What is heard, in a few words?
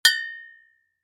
Impact Glass Bottle Ring; Glass (Sound Effects:Materials); Blastwave